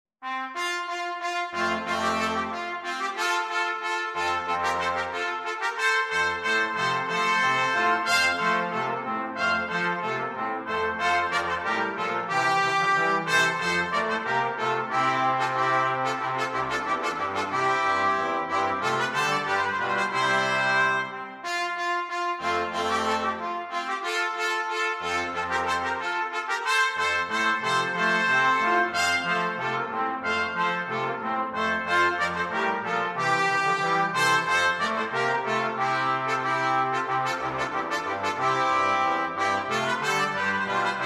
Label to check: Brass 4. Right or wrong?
Right